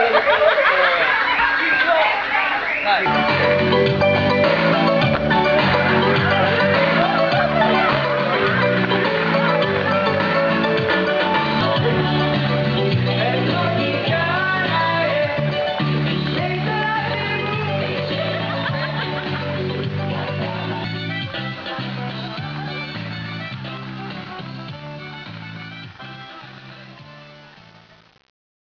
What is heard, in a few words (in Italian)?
karaoke